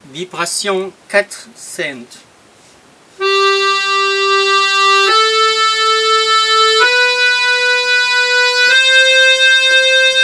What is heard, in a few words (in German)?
tremolo vibration 4 cent, akkordeon; frz